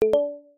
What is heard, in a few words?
notify message